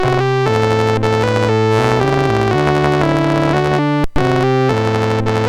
BASIC sid MOS6581R4

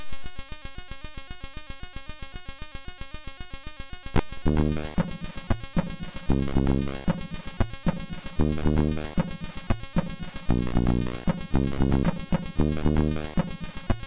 MOS6581R2; hvsc

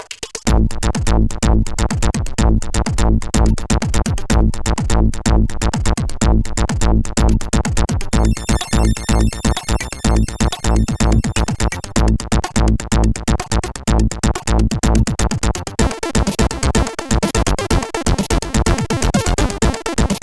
Intro Tune, sid CSG8580R5

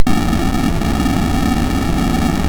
sid 6581; Slinky; MOS6581R4

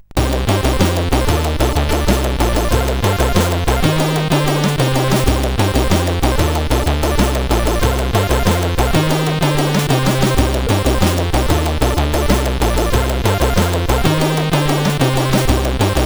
sid, CSG8580R5

